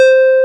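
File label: synth harp; NEW AGE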